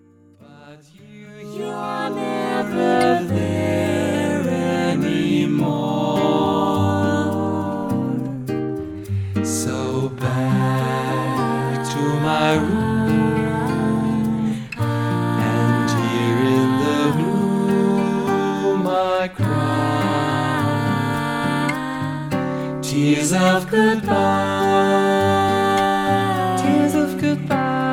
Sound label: Bossa Nova